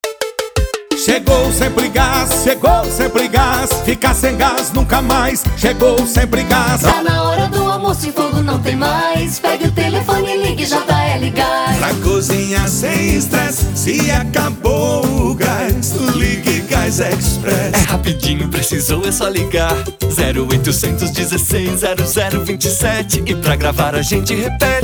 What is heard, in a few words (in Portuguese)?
jingles gas; mundi gas